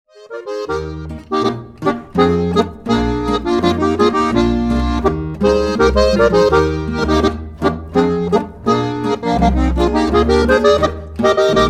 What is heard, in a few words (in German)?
Dupferl Boarischer